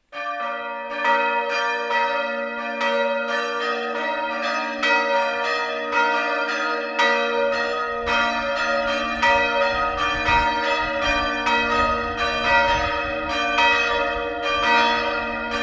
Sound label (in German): glocken